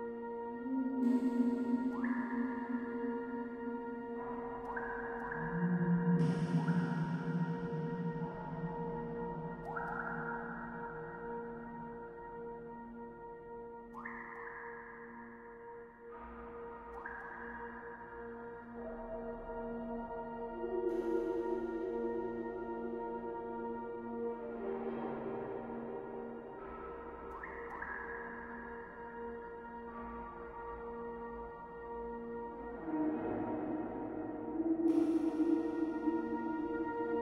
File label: Sewer Hum And Strings; mr future ninja